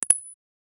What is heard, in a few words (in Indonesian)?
panah menancap, bow and arrow